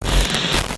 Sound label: glitch